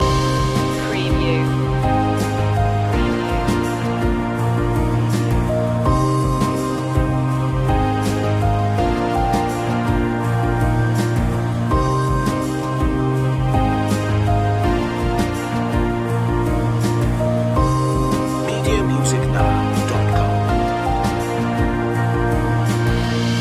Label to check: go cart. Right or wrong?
Wrong